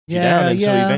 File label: singing yeah yeah